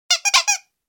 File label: toy horn